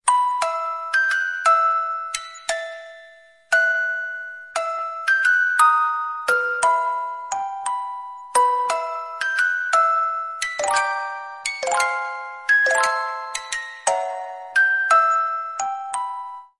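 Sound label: loop, ringtone; harry potter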